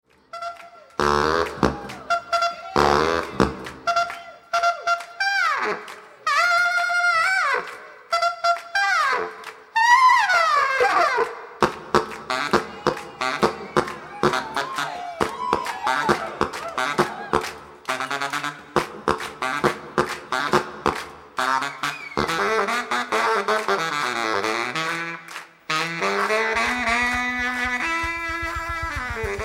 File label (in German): Saxo Bari